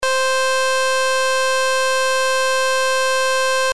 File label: sync, soft synthesizer oscillator; Synth1; 10-HAKの自由帳 ゲームとDTMのブログ